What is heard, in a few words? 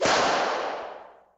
big whap